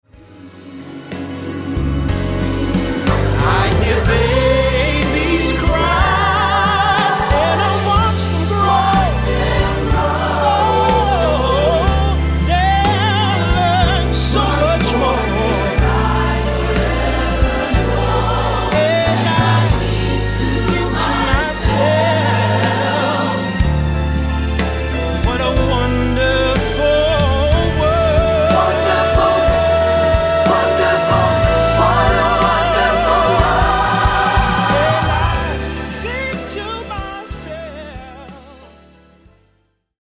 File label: DRUM BOUNCE